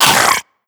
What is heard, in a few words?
choke, zombie vox; creatures